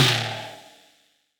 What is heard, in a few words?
FX TOM, Drums Acoustic; FX KIT; USB Soundscan vol.10; AKAI; 90s sample CD